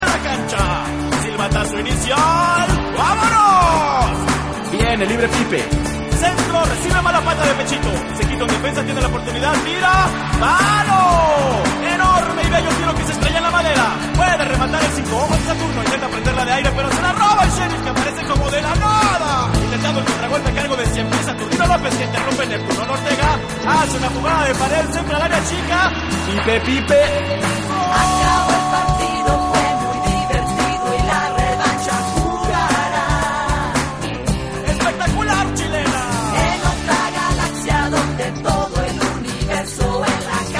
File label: pipe